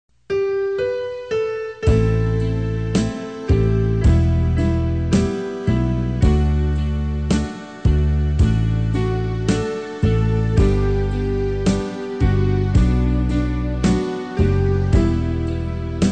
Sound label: BASS